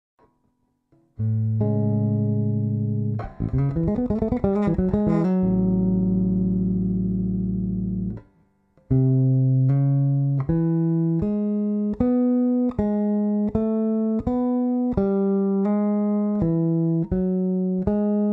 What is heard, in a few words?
Amaj7 chord scale lick